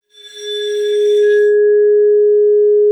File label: ARCO G#4, VIBES; Xsample VOL-4